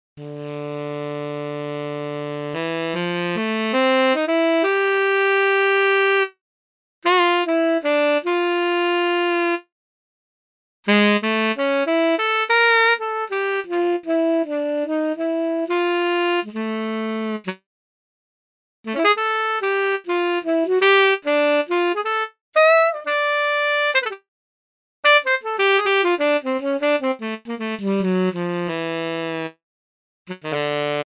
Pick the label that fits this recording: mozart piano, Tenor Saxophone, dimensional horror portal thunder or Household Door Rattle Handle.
Tenor Saxophone